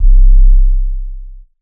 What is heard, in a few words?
sub 808; drumkits; xan sub 808; fl studio